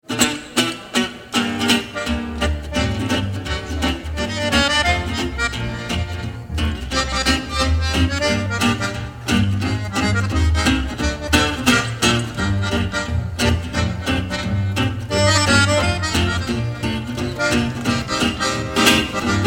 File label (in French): Douce Ambiance